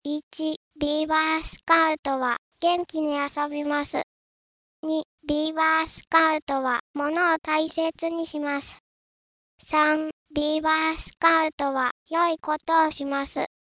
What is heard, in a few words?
beaver